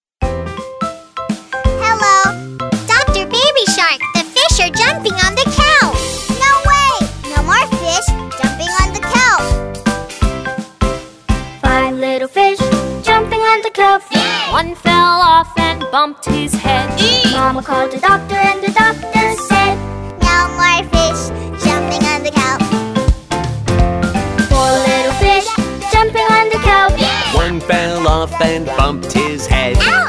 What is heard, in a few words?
Baby Music